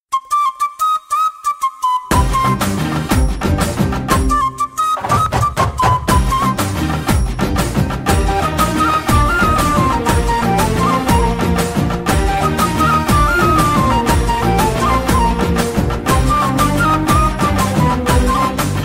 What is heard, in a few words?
intro bgm